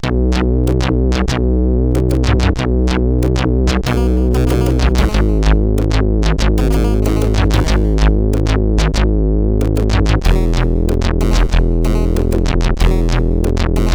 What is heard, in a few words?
MSX sid CSG8580R5